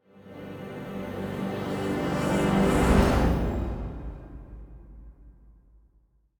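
Music Percussion, Percussion Transition Loading start; Forza Motorsport 6 Apex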